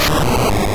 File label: Barrage